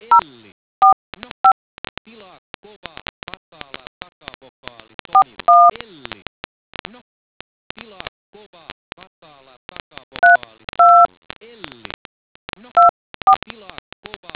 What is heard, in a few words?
dtmf